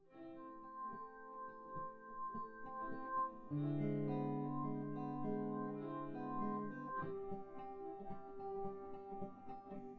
nodrums synthconv

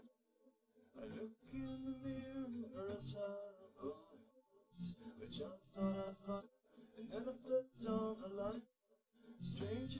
nodrums, live rec

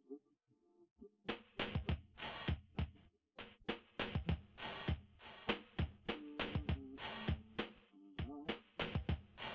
wdrums inst sim; SiSEC10; underdetermined

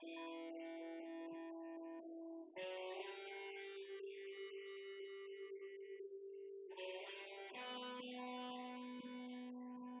wdrums synthconv; SiSEC08; SiSEC underdetermined

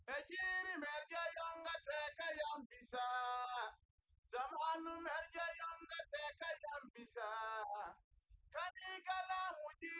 nodrums liverec; SiSEC08; SiSEC underdetermined

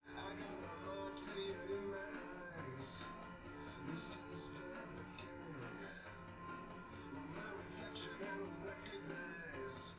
wdrums

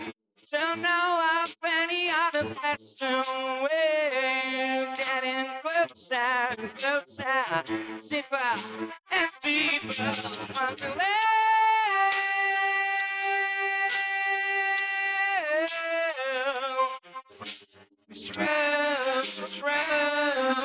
vocals; SiSEC11

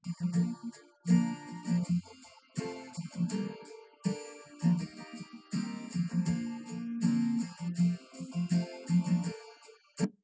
nodrums; SiSEC10; underdetermined

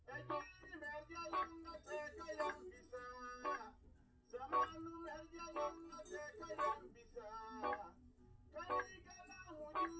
nodrums liverec; SiSEC10; underdetermined

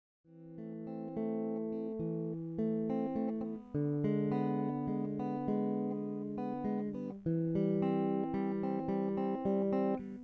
nodrums synth conv 250ms

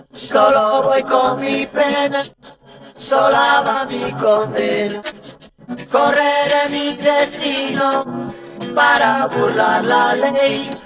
voice

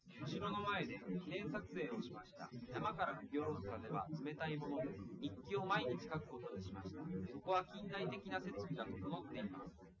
male liverec 250ms 5cm sim; SiSEC08; SiSEC underdetermined

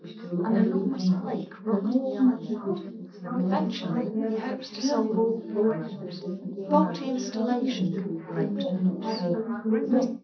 female, 380ms 4cm; SiSEC11; underdetermined

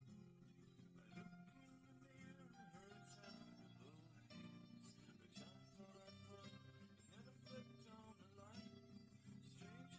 nodrums liverec 5cm; SASSEC07

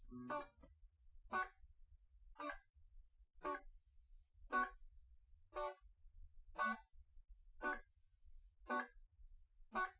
nodrums liverec

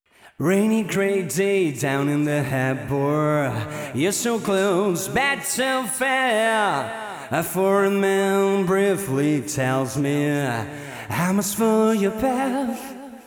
vocals; SiSEC11

